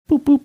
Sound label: boop boop